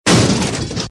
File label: crash